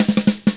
shuffle, Drums; ILIO; Double Platinum Drums 1

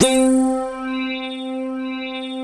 PPG STACK C4, Analog; USB Soundscan vol.49; Analog Fantasies; AKAI

